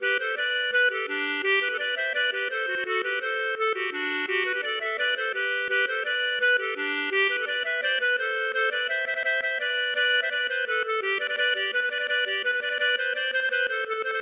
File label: samba